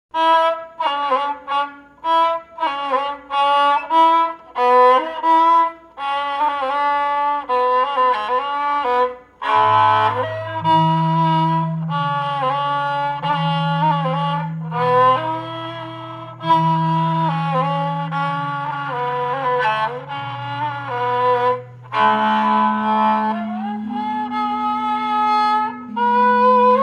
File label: pangkur example rebab